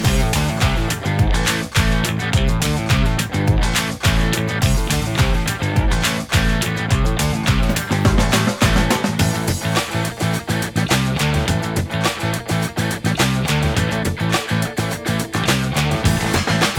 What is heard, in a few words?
Huawei Ringtone